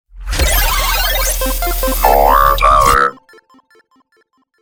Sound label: jingle